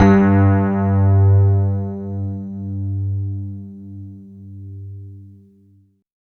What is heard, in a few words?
RHOD G2; East West - Phat & Phunky